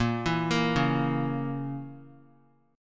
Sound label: Bb Diminished Bb Guitar; arpeggiated; JGuitar